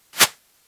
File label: Arrow Air, Longbow; SteamVR; InteractionSystem